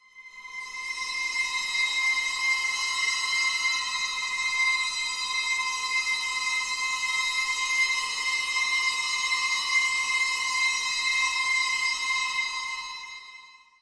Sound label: dissonant pad, Synths; Notes